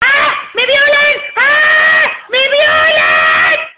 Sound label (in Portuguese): violao